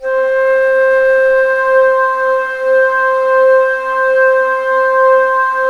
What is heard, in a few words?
ALTO C4, ALTO FLUTE; USB Soundscan vol.23